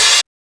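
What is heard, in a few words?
OHH, open hi-hat; X-Static Goldmine 1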